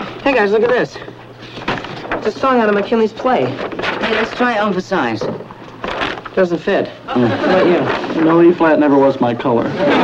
song

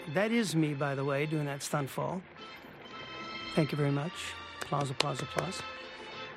applause; Head (Davy, Micky, Mike, Peter Commentary); Back in Bronson Canyon; That is me, by the way, doing that stunt fall. Thank you very much. [Micky claps.] Applause, applause, applause.

